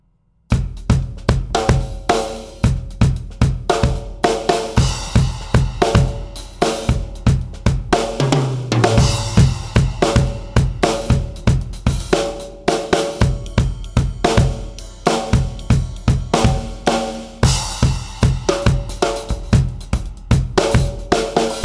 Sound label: Break Beats